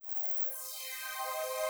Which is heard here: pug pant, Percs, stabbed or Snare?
Percs